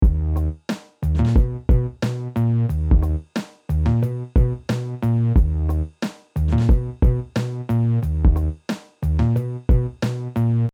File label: Riff Bassline